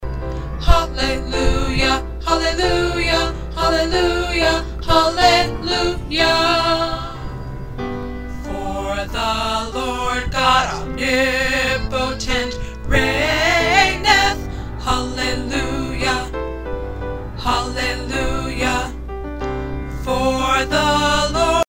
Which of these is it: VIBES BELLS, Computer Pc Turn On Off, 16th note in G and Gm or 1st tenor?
1st tenor